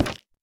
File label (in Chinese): 下界木活板门开关声